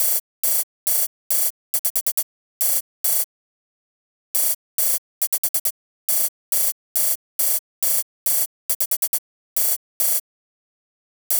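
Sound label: F minor 138 BPM hats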